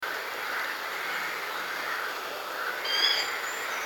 turmfalke

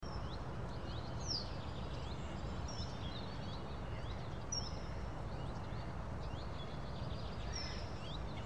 rohrammer

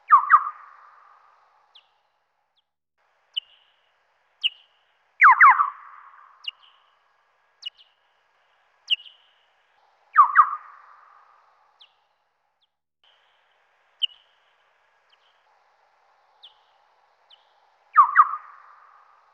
Mopsfledermaus, Fledermausrufe; fledermäuse rufe

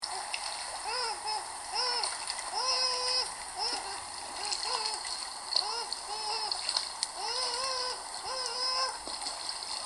biber; see

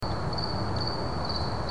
feldgrille; Insekten

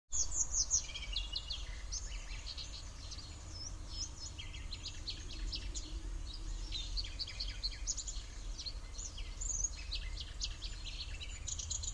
nachtigall; erlenbruch

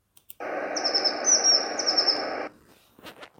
haubenmeise

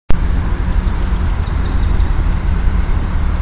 flussuferläufer

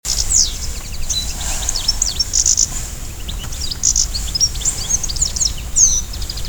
stieglitz, rufe vögel; voegel

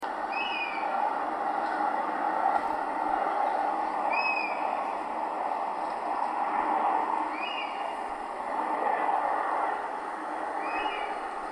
wespenbussard; Vögel

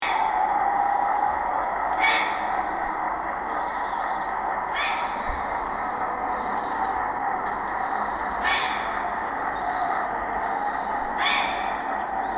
graureiher

